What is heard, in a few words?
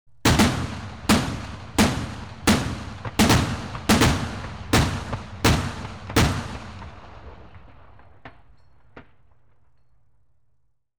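Warfare, Scimitar, Firing On Automatic; BBC Sound Effects Library; Explosions Guns Alarms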